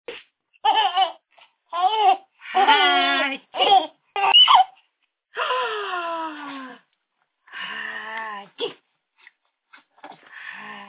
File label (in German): lustiges Baby ist lustig lachen